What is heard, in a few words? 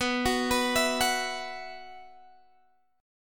B Suspended 4th, Guitar, Standard; arpeggiated; capo 3; x,14,16,16,17,14